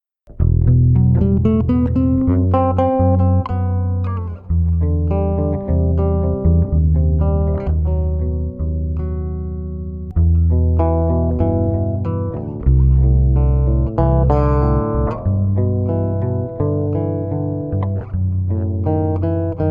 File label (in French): Ibanez 5 cordes EHB1505MS, Une basse 5 cordes moderne; micro aigu; Lagoon